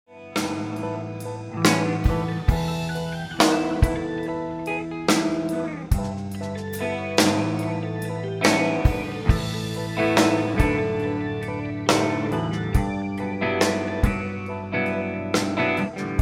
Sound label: guitar line